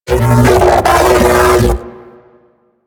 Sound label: Mech Ambient Vocal; Warframe; DE; Vehicles